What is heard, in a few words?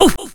Oof; Nicktoons Nitro; arcade